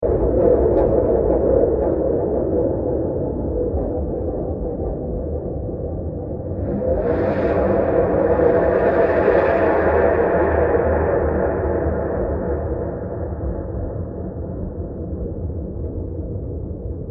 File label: Scary Ambience With Hits And Other Objects 120bpm; Scary Soundscape; Crafted Signals